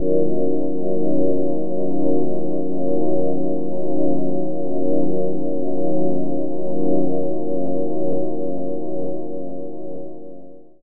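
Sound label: Pad Synth; Equipped Music; Loungin House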